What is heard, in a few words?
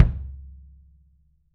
kick, 3D Drums; RUBB KICK